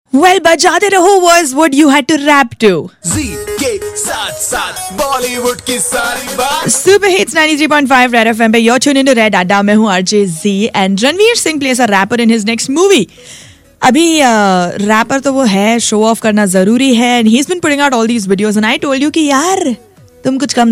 rap

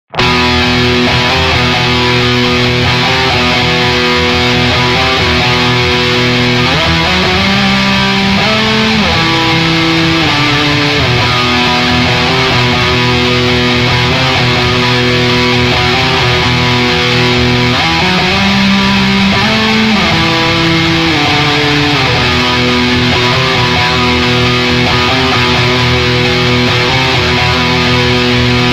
old guitar test some metal

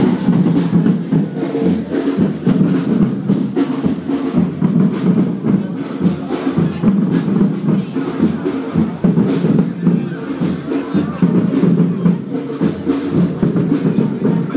festival drummers; Hoxton

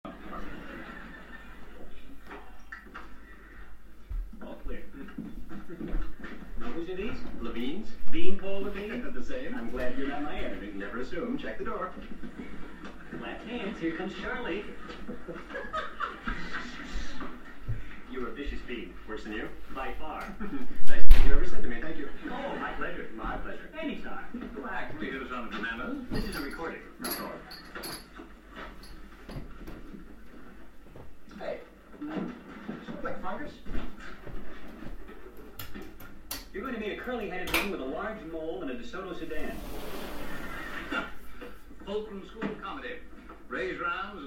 binaural soundscape

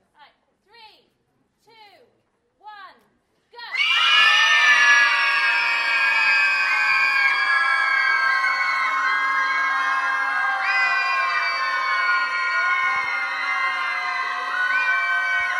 longest group scream; record breaking attempt; Weymouth library

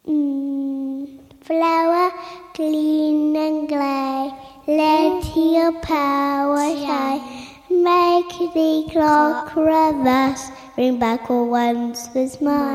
Flower song, with backing vocals

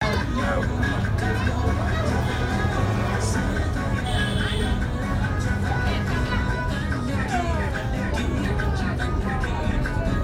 Shakiso market day music and transport

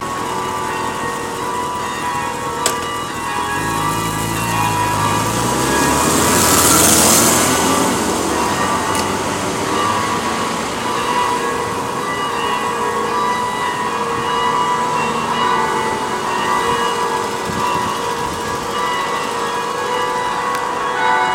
cathedral bells traffic

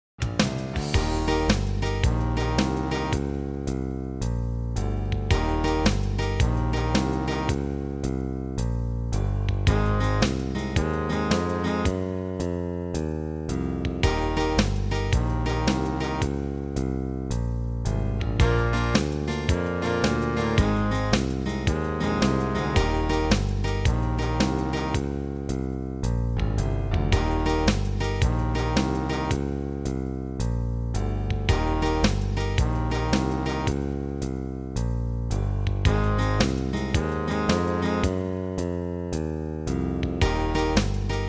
the blues horn Eb

